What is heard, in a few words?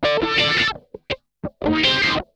RASP, Funk Guitar; 90 s Sample CDs; Zero G